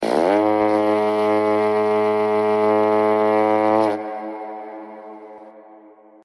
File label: Horn; Tibet